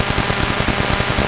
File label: Comm Noise